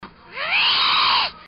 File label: Eeehh